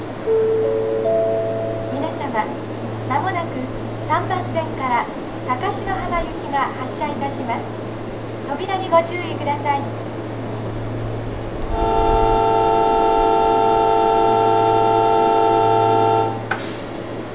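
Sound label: bell, station departure announcement; hagoromo; 羽衣支線ホーム発車放送＋出発時機合図; nankai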